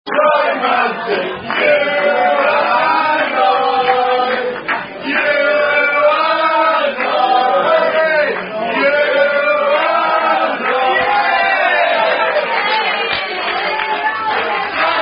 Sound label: Rugby Sing Song, You And I; Bath Rugby